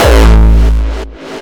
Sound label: Hardcore Kick